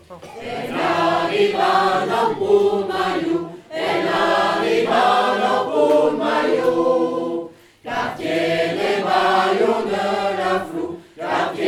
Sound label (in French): Chorale